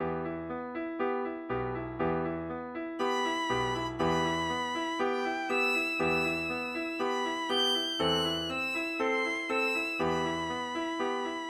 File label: violin